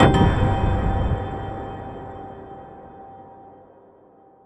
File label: se horror; ＳＥ デデーン; 不穏 ジングル・サウンドロゴ スタート・ゲームオーバー; ホラーボイス・声ネタ・効果音・ＢＧＭ